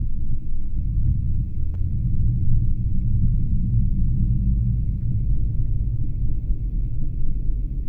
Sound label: tram